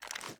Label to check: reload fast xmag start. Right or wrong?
Right